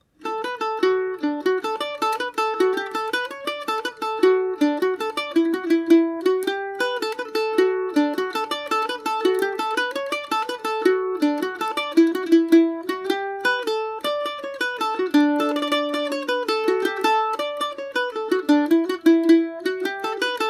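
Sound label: follow her over the border d major, mandolin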